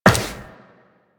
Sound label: Run Metal, Footsteps; Warframe; Tenno